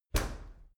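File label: dry cleaner open soft, maschinen türen; tueren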